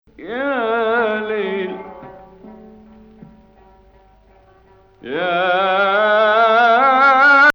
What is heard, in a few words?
Hijaz Octave